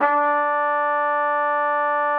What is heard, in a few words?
TROP D4, TRUMPET; Xsample VOL-3